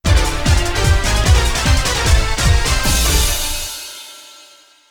Stage Clear; Trap Gunner Countdown to Oblivion; PS1 gamerip 1998

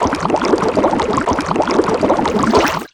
Enemy Poisonwizard Apple Stir; Disney Star Smash; Android, iOS, Mobile

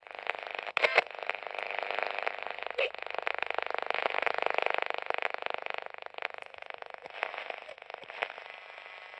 Radio; Subway Surfers City Sound Effects; Android; Music Tunnel Section Radio

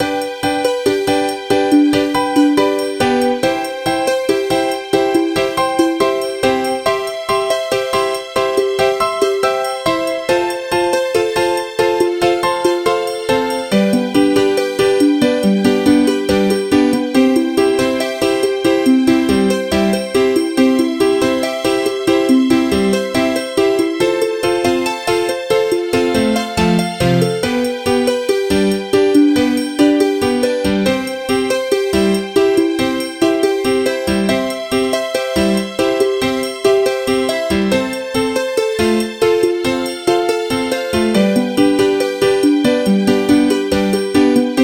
Elevator Muzak; MirrorFall

